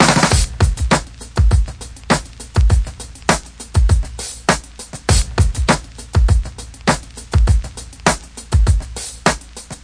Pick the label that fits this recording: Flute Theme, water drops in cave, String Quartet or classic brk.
classic brk